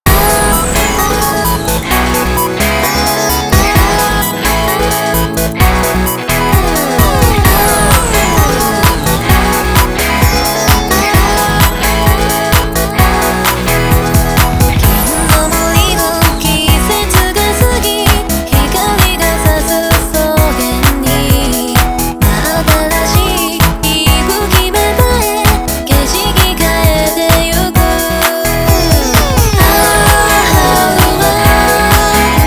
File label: 8bit mix